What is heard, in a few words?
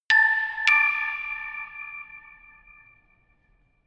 notification glockenspiel creepy fourth up